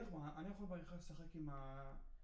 Children Choir; Studio Bekol Children Choir

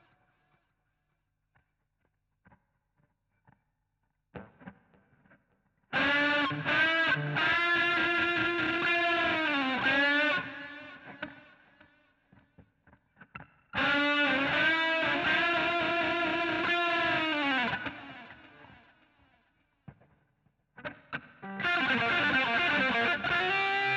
GTR A Solo

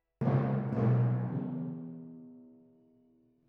Timp

